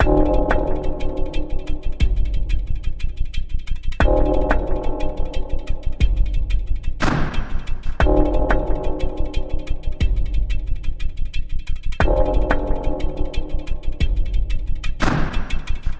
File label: 120 Rhythmic Loop; Umlaut Audio; kontakt